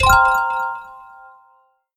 gambler win; Fenix Play 27 Deluxe; Wazdan

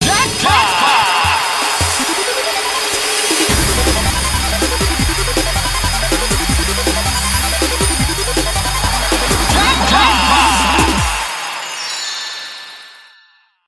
jackpot; games; Dynamite 7

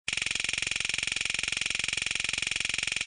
roulette spin; games; poker; American Poker The Legends II

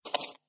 gambler mouseover

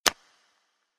gamble mouseclick; games; Corrida Romance; Wazdan

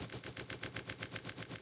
reels rotate; Sizzling 777; wazdan

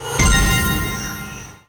nice win; Book Of Pharao

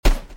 wheel stop; Fruit Fiesta; Wazdan

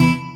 scatter short; Great Book Of Magic; Wazdan

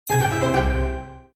win; Elven Princess

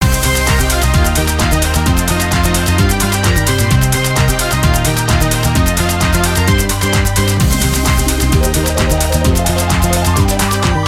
free spin melody; All Ways Fruits

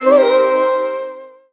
bigwin; Fenix Play 27 Deluxe; wazdan

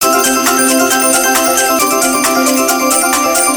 countup; Hot Twenty; slot game

